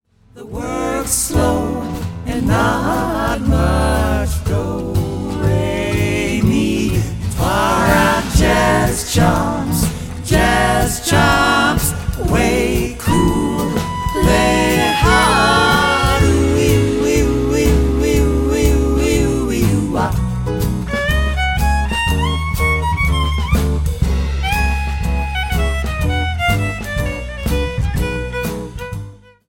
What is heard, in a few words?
jazz chops